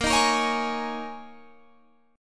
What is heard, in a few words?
G sharp Suspended 2nd Suspended 4th, guitar chord